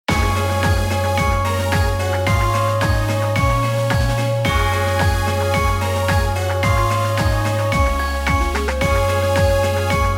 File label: menu